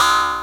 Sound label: Robot Noise